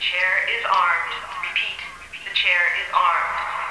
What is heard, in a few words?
CHAIR